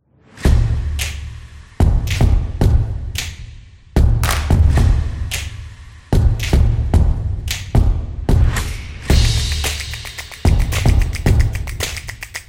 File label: Drum Clap Snap; percussive nation vol 5